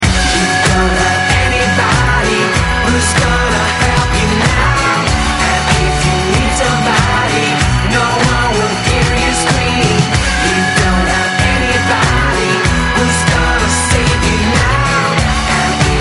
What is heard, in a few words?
Scream short